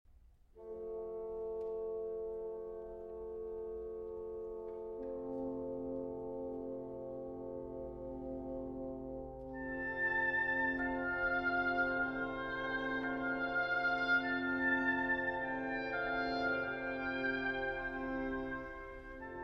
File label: Concerto in D Major for Violin and Orchestra